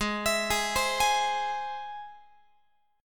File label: Ab Augmented Ab, Guitar, 4A, 4E, 5A, 5D, 5G sharp, 6C sharp; x,16,19,18,16,19; Ab+ arpeggiated